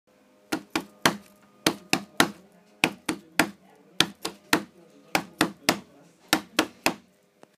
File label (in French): rythme; projet cerveau